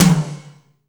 TOM, Drum Dry; East Collexion; Drum 1

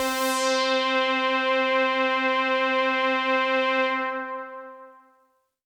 Pad C, Synth Prophet One Shots; Electronisounds

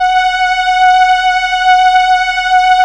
SIEL, String Machines

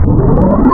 Turbo Pan Tube, wavetables; Animoog

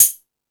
High Hat, Drum Machines E-MU Pro-Cussion

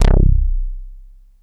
BASS C1; Q Up Arts; Dream Experience; BS+KEY

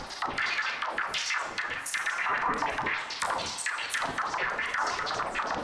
Tonal Re Draw, sparse soundscape

